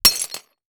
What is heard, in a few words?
Impact Ceramic Debris Hit By Small Metal Pole; Impacts